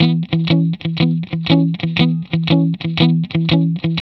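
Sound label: 120, Vintage Blues Guitar rhythm; USB Soundscan vol.22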